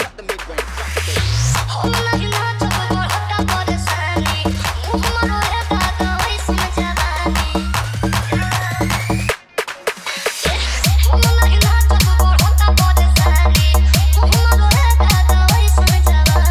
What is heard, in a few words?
Bhojpuri Dj Remix Songs Edm Trance Mix